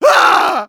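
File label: pain severe, German; Medic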